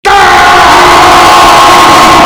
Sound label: heavy scream